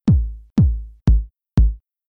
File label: House Kick, Rhythm